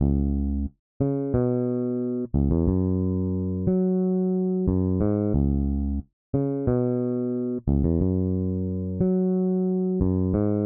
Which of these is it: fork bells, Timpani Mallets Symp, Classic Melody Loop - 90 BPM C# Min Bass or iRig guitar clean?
Classic Melody Loop - 90 BPM C# Min Bass